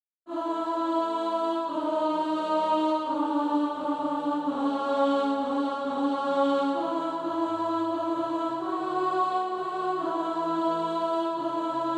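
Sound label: alto, Sheet music (SATB) including Practise Music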